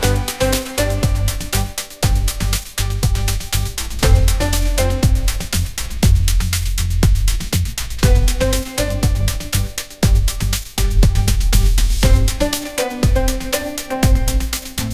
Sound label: pop instrumental